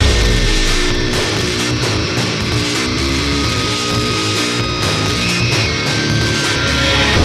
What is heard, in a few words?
GUITAR RIFF; gamerip; IACON INVASION